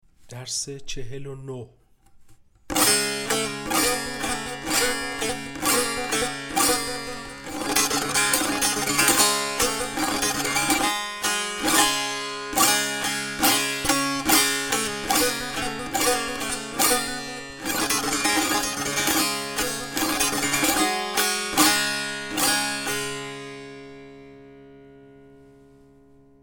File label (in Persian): مشق تنبور